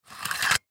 Camcorder Remove, Camcorder Remove Tap From Deck Sony Mini Dv; Camcorders; Technology; Blastwave